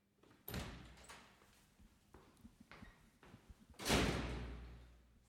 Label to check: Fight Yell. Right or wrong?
Wrong